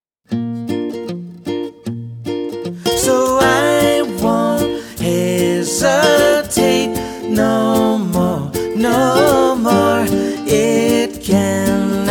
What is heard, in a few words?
w perc